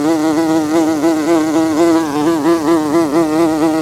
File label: insect buzz